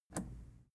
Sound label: plastic button scratch release enable